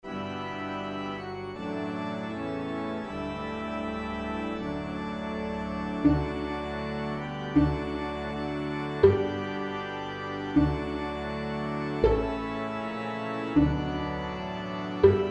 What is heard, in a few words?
St Anthony Chorale Organ; Vamoosh; 3 St. Anthony Chorale